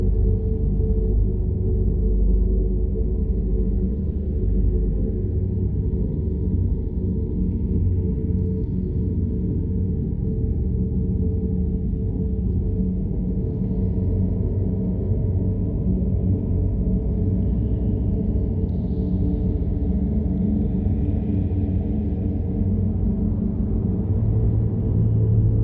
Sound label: corridor, ambience; cstrike